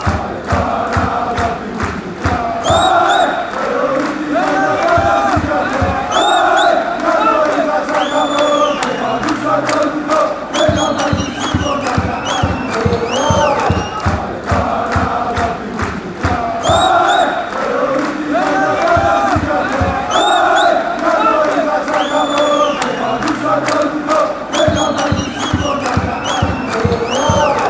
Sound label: aek athens chant